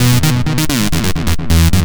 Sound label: NRG Bass, Trance